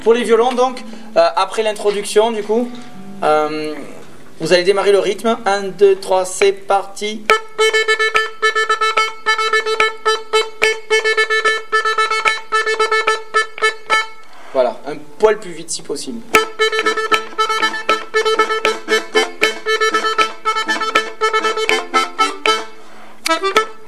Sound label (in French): intro violons